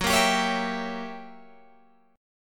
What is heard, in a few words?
F sharp 7th Flat 9th Guitar Open G, chord